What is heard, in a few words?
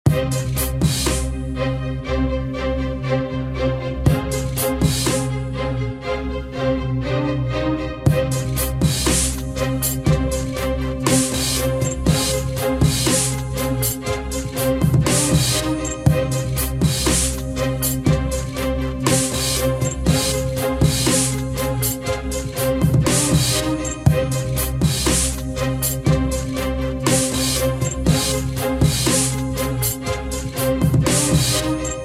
Epic Cinematic Electronic Futuristic Action Movie Theme